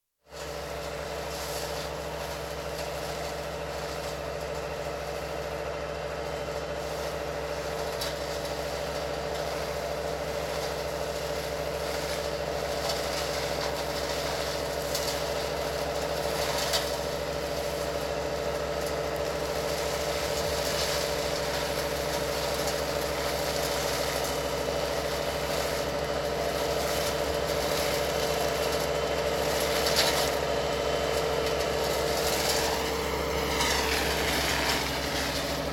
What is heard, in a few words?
Tractor with Hedgecutter, exterior, hedge being cut (Very close perspective of hedge being cut near microphonr, with some image shift); Farm Sound Effects; BBC